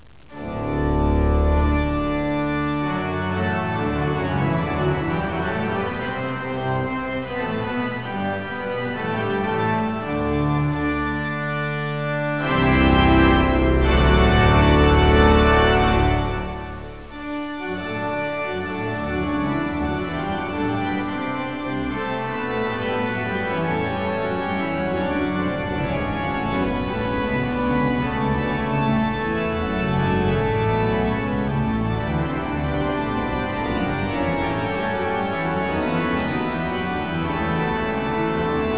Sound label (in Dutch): orgel